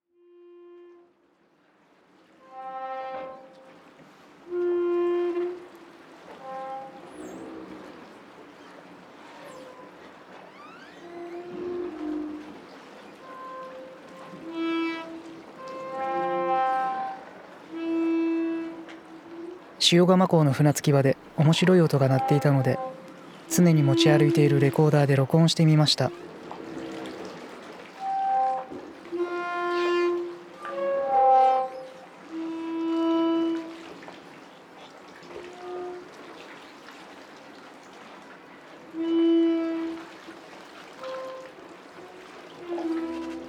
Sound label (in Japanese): 塩釜港の船着場ナレーション入り